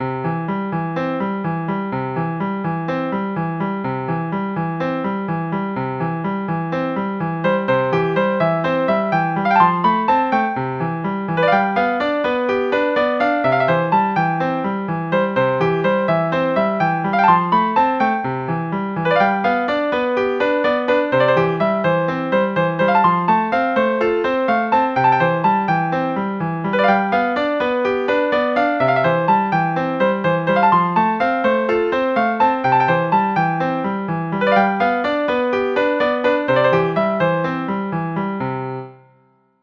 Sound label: Music box